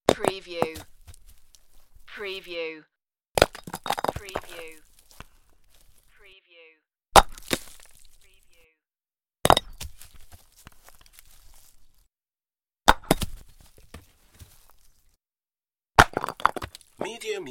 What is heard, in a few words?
Rock Impact With Rocks And Tumble Settle